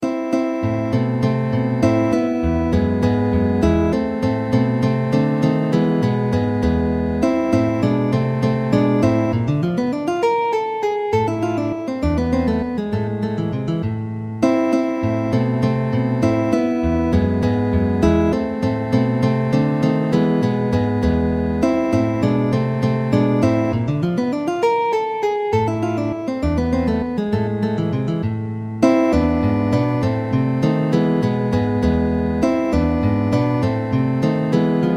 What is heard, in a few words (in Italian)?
valses, guitar